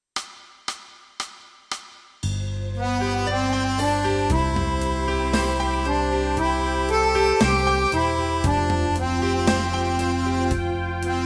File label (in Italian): Fisa tutti